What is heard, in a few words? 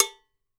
COW MU; Sampleheads; NYC Percussionworks; 90 s Sample CDs